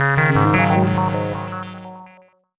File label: Jingle Quest Failed